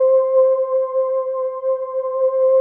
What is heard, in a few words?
505, String Machines